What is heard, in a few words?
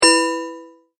Multimedia Tone-Stop